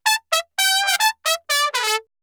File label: 124 trumpet loop; runner